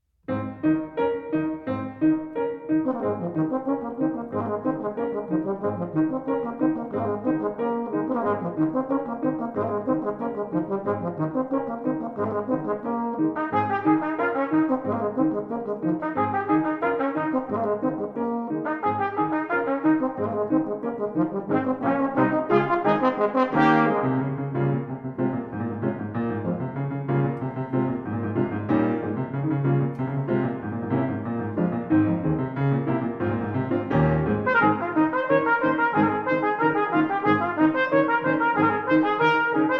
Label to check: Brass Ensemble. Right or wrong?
Right